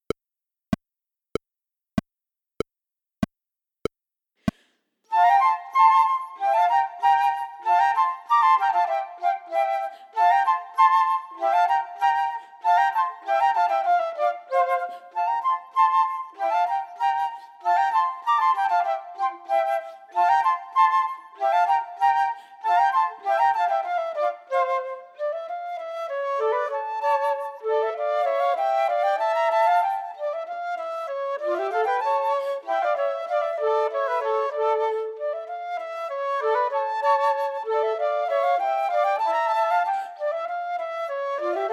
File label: Colonial Country Dance minus 3rd part